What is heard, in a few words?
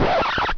level down; svencoop; scxpm